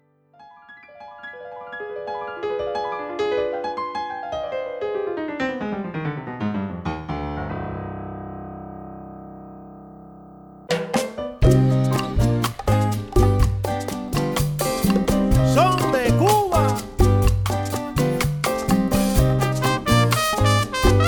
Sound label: Rumba Mambo Cha Cha Cha